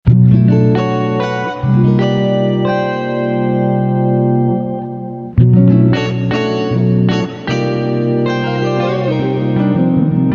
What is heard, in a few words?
Poly Override 5th up blend